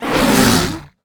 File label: Monster Dragon Attack; Inotia 3